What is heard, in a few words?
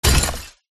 impacts heavy box drop glass inside smash